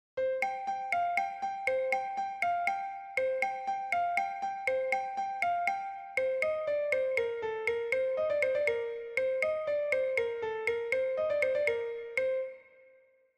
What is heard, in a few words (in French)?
Bourree, vielle a roue